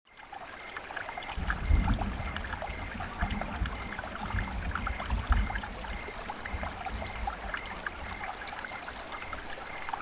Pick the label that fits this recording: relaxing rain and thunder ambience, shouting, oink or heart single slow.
relaxing rain and thunder ambience